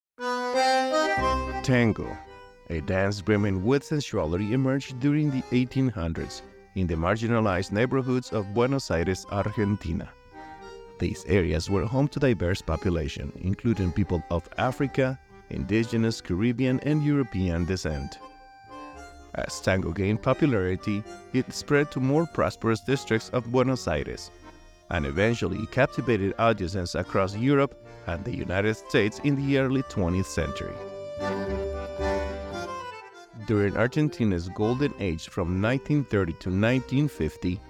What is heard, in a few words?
Tango Romantico